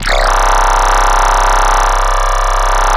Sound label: Alive Lead; Cymatics; Noise Osc Samples for Serum